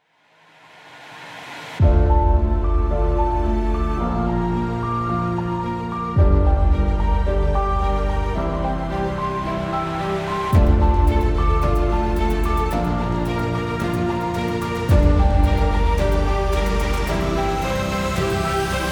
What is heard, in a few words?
epic dramatic inspirational logo